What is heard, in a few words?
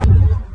artifact Kick; microsound